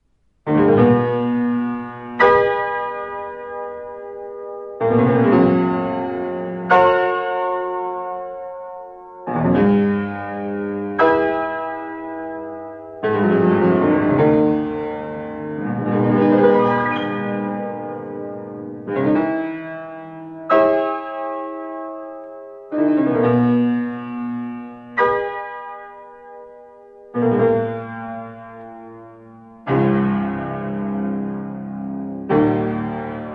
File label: Piano Overture